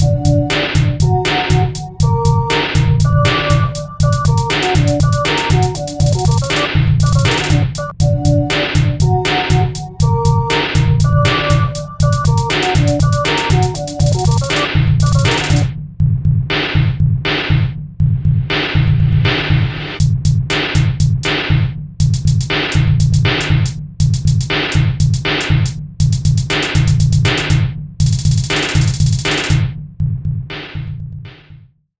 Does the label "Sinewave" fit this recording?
Yes